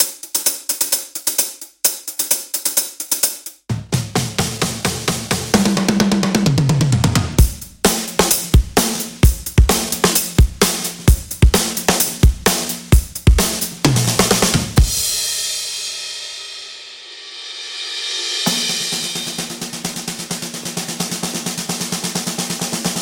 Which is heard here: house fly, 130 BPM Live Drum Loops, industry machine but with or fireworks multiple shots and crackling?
130 BPM Live Drum Loops